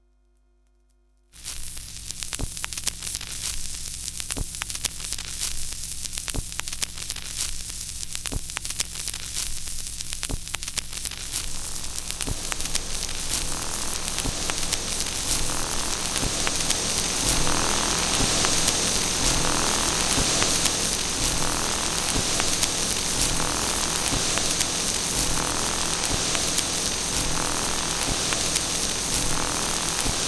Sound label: Rua da Alfandega, Lisbon